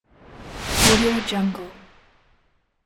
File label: slideshow sound